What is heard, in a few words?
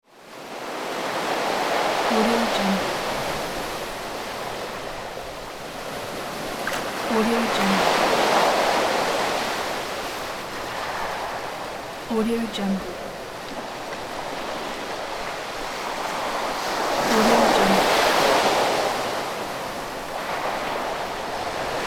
sea